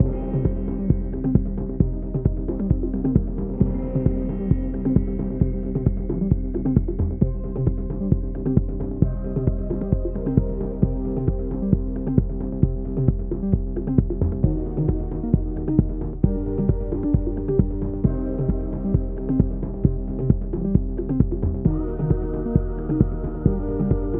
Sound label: Panic Loop Filter; pang adventures